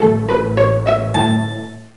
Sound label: Victorian Win; Mystery Tales: Time Travel; NDS